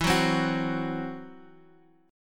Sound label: E Suspended 2nd Sharp 5th E, down, Guitar, Standard; Esus2#5 strummed